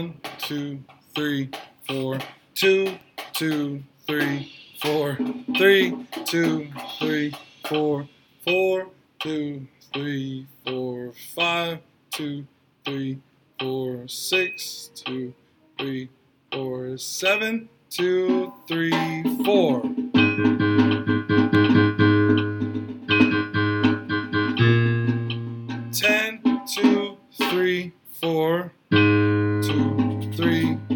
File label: tuba